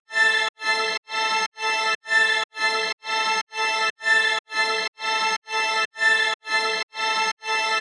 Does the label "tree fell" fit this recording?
No